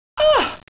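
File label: aaahhh; mink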